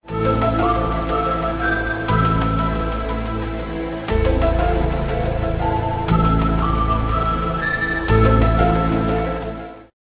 ringer; Ringtones

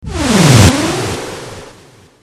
Wooshe

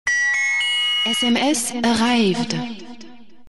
SMS Arrived, Ringtones

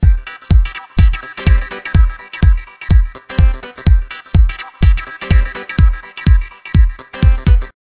ringer; Ringtones

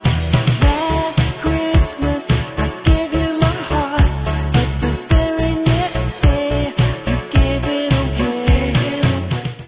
ringer; Ringtones

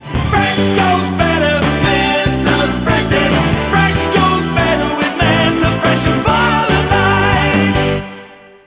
ringer; Ringtones

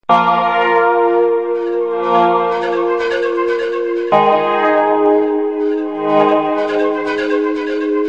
trackloop

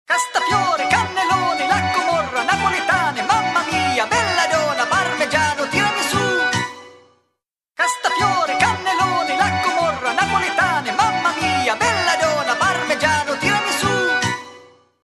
Tarantella, Ringtones

